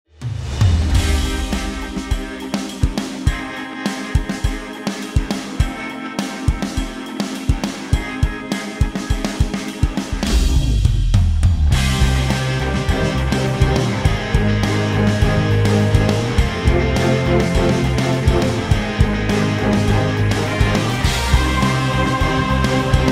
TV Theme Song; MasterChef Celebrity

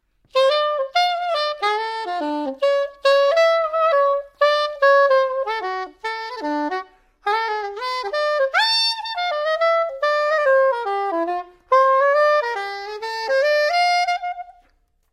saxophone soprano

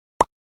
Pop Sound Effect; Minecraft

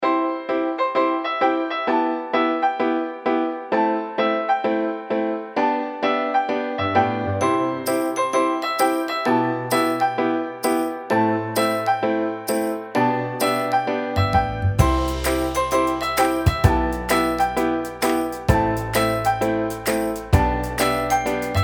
Happy Bliss Simple Jingle

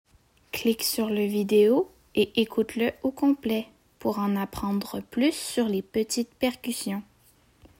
percussions

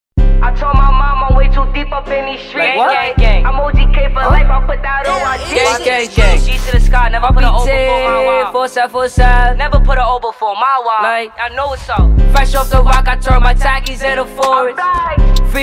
beatbox Remix

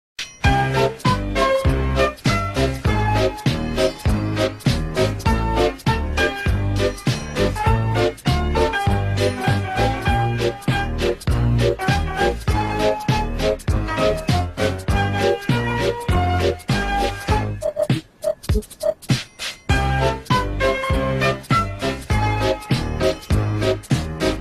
theme song; thomas the tank engine

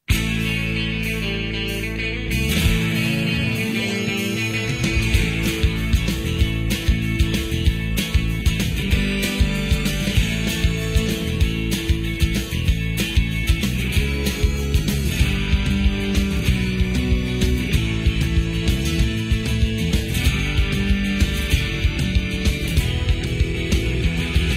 Theme Song Instrumental; Friends

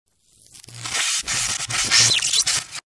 Electricity Sound

